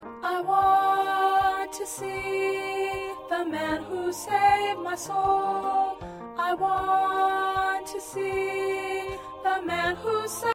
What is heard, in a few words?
Alto